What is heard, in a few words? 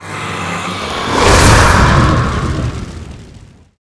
mud man skill